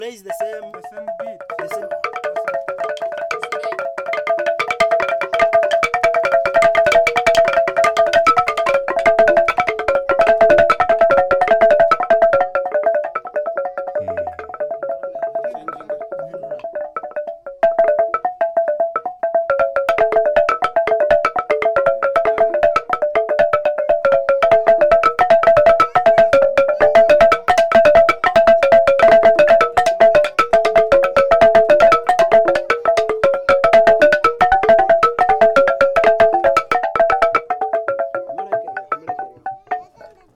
brief xylophone, Southern Uganda Rwenzori Mountains; Traditional Musicians